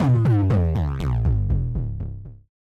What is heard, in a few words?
Bass Drop; SoundsCrate